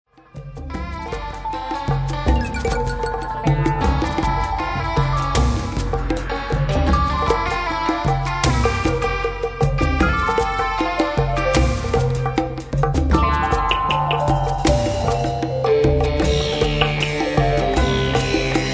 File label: new Age Grunge